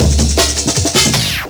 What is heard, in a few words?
Effect Break; Jungle; Breaks; Blu Mar Ten - Jungle Jungle - 1989 to 1999 Samplepack